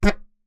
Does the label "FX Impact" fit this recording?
No